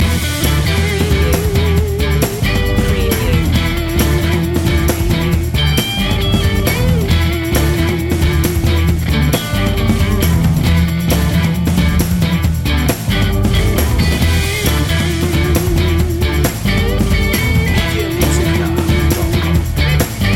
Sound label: dorm room loop